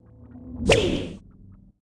Baloon Attack

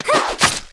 attack; Archemist F attack

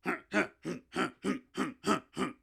Run loop

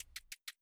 Moss Charger Small Run

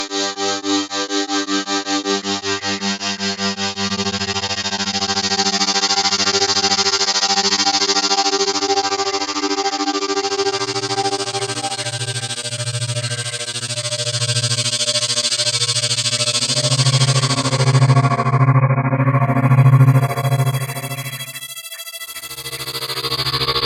drone burst test; Local Access (droneburst test); blippo

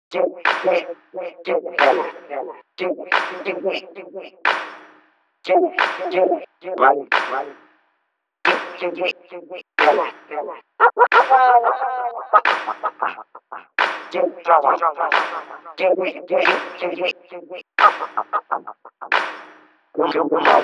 Plaza Ambient; Inkopolis Plaza

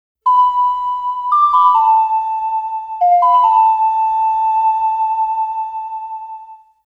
New Wave Bossa Nova - Ocarina; The Legend of Zelda Majora's Mask 3D

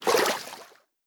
Water splash

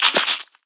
Flycaller Attack

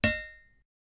Drinking Fountain

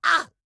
Pirate Barrel; rabbids multiverse; iOS, macOS, Mobile

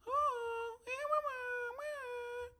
Grub Wave

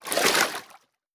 Water splash; Legend of Keepers (gamerip) (2019)

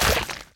Obstacle Icecream Removal; In Obstacle Icecream Removal

